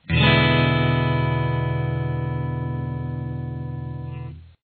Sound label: e dominant 7th open, guitar